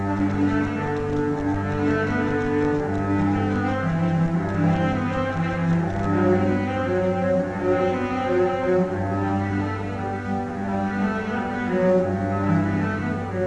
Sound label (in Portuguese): violoncelo; Instrumentos